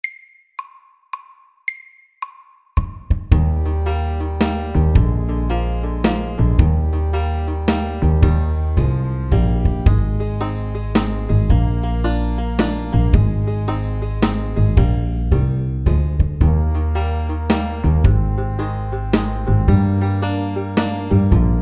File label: Country shuffle MEDIUM 110